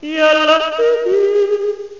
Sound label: yodel; Take 2 v1.0; Human